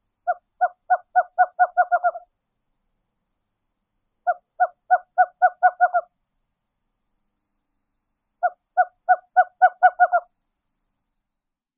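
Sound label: song, Western Cowbird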